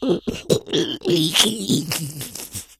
become alert, infected; npc; Left 4 Dead 2; Source Engine

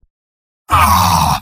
negative vocalization; spy; video games; source engine; tf; mvm

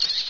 rch die; roach; Source engine

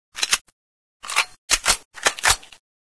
npc ar2 reload, weapons; Source Engine; video game

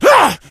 been shot, infected

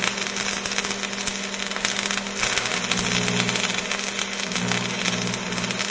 welding loop; ambient; source engine; ep2; outland

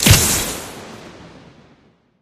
arm zap; barret; tf

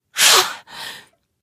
breath; Portal 2; Source Engine; video games

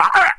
odd, infected die; Left 4 Dead 2; Source Engine

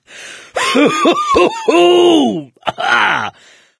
laughter, mechanic, survivor, voice; source engine; left 4 dead 2

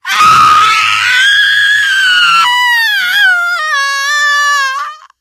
death scream, teengirl; source engine; left 4 dead 2

